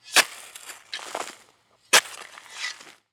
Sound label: shovel dirt